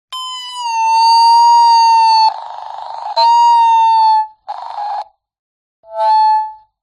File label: technology walkie talkie radio feedback